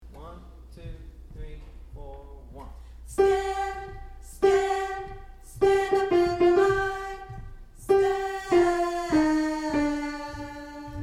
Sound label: Alto part